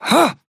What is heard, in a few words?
effort melee small; Trapper